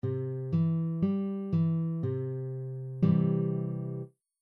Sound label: triad c major